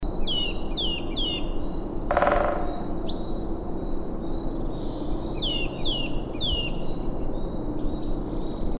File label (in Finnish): naaras call